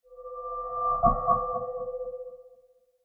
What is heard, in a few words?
Mysterious Metal Transition With Delayed Impacts; Scary Soundscape; Crafted Signals